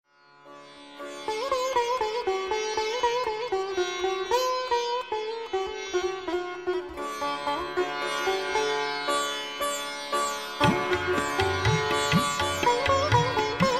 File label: Sitar folk Dhun